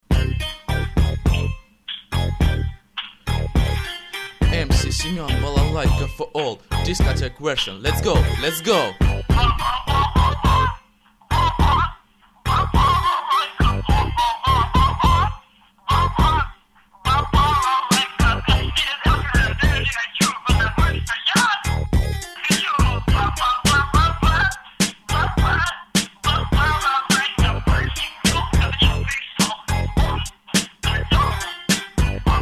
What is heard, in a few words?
Balalaika disco version